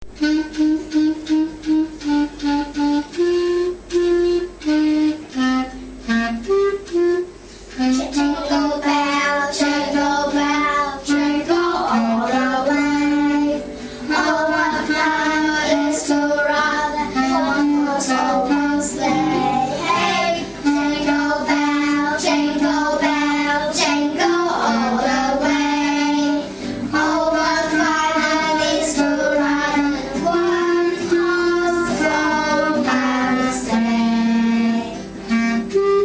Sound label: jingle bell